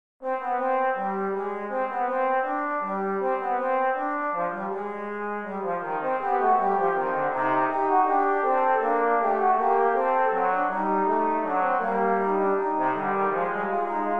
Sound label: Fugue Trombone Quintet; WTK Fugue 2